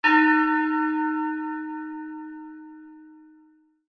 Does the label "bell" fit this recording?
Yes